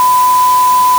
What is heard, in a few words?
Sin Osc 1001